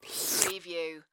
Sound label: Slurp; Sound Effects Bible